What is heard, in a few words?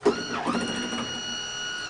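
front door close; volvo 9900; Freyfurt Beta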